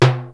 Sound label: Timbale L, Boss DR-550 Percussion; Drum Samples